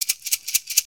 Welder Shake; foley

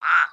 Frog Croak; creatures organic

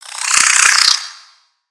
Spider Angry; Lego; aronwk collection; ndaudio; creatures mechanical

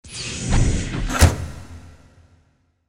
Elevator Elevator End; ambience

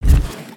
walk; creatures organic

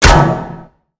Robot Get Hit Dry; Lego; aronwk collection; ndaudio; creatures mechanical

